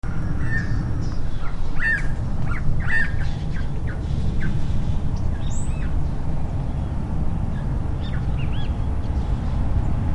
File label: elegant quail